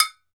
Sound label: TOKE BEL, Drums&Percussion, Toke Bell; E-MU Producer Series Vol. 7 – Old World Instruments